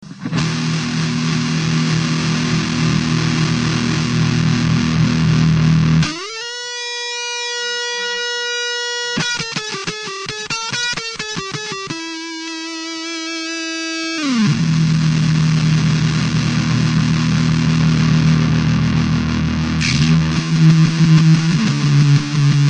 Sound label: HI COMP FUZZ